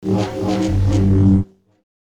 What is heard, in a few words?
Saber; FXHome